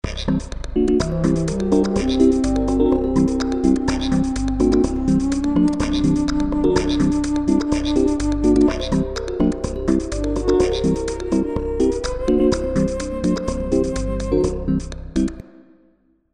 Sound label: Sing